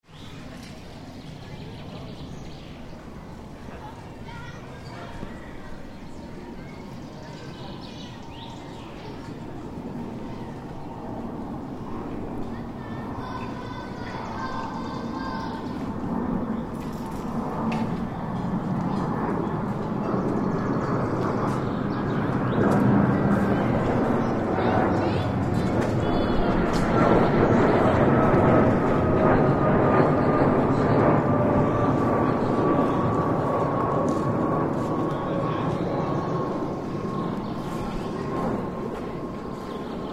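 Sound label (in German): Fluglärm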